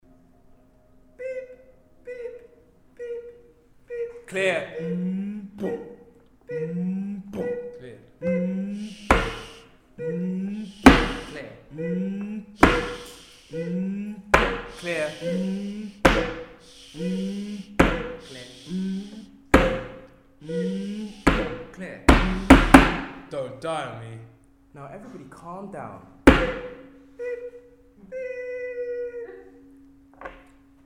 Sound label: Beats Per Minute BPM Hospital